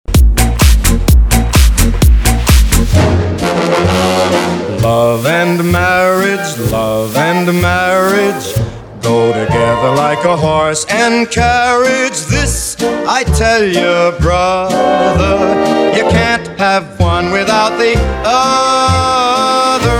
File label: Classic 50s Club Party